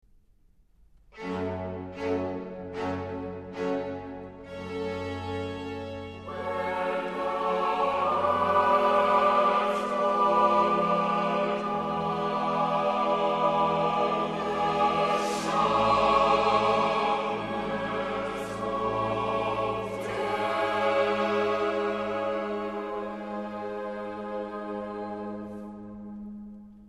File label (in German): tedeum Chor